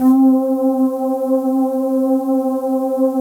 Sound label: PAD, DIGI-PADS